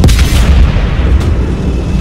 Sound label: fire